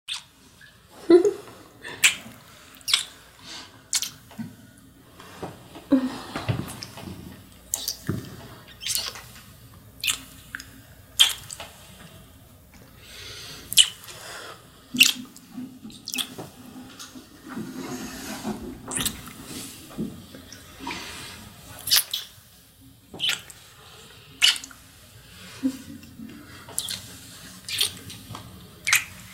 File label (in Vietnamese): Tieng cap doi hon nhau dam duoi say dam